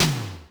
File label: snaredrum, Yamaha RM 50